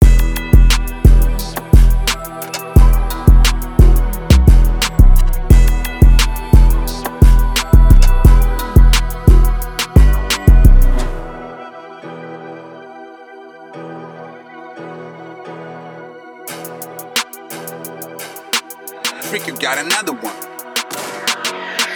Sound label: Type Beat, 175 bpm E minor